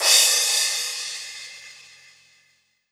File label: Crash, Cymbals